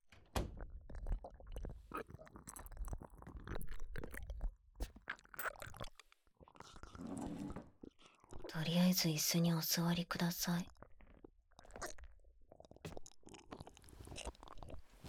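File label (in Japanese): 耳舐めされながらクールな女店員にディープキス・手コキ・フェラ・騎乗位でイかされ続ける音声; 23分間耳舐めされながらクールなパチンコ店の女店員に会員様特典のディープキス・手コキ・フェラ・騎乗位でイかされ続ける音声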